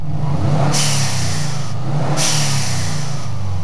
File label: respons std trumpet atmosphere under bonnet